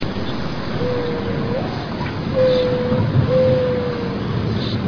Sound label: dove coo